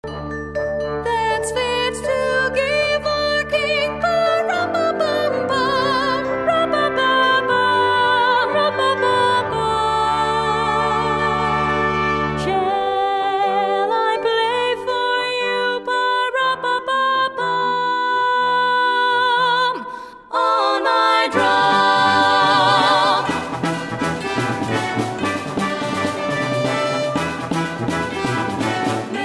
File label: Short Little Drummer